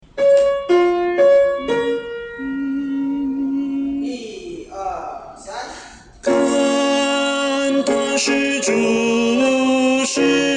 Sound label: tenor